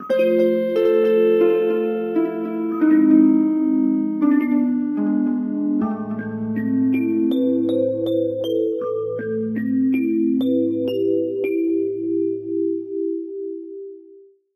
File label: Gameover